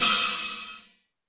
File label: alloy crash short